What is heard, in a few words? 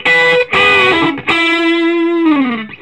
SOLO E, Vintage Blues Guitar